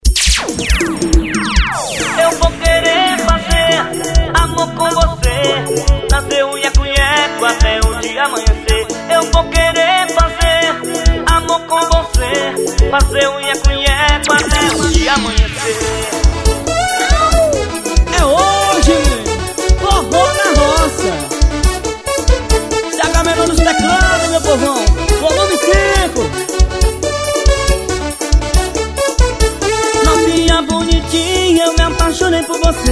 teclados, ao vivo; agamenon dos teclados

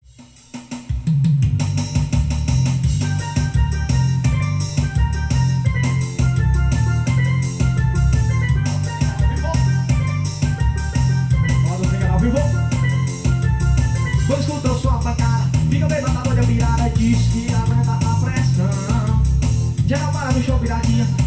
forro do swingado

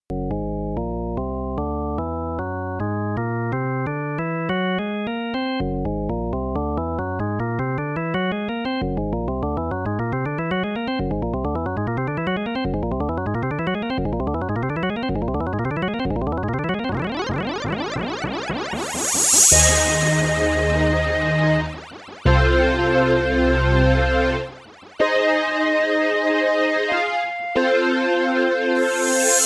amostra electronica

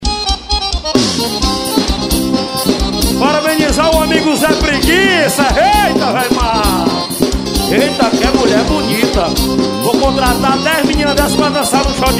forro das antigas

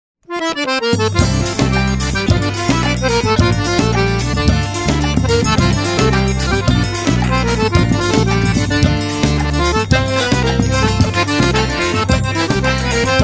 acordeon forro

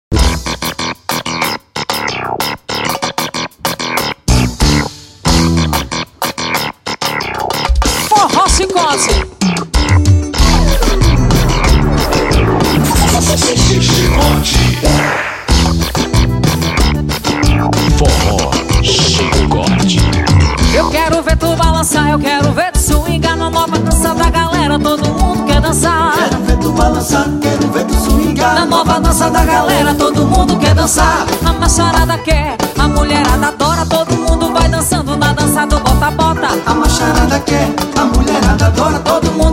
forro chicote

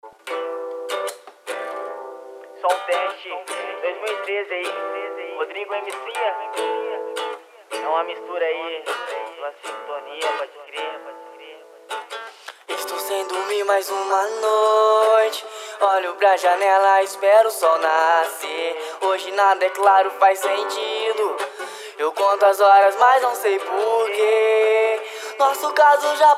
tocando violao

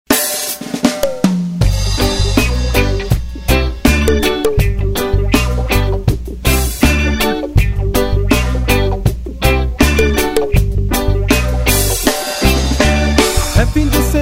kamaleao reggae

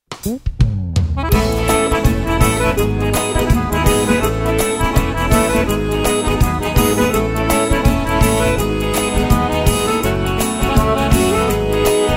xote

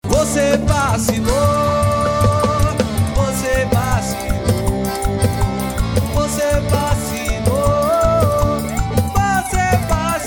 musica alegre

